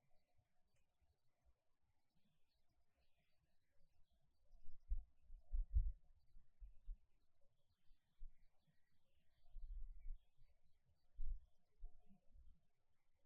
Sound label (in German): morgens vogelstimmen naturgeraeusche; Grosser paetschsee plau